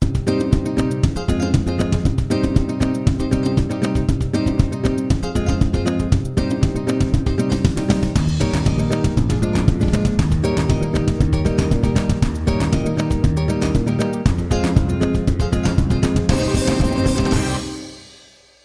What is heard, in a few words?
Gypsy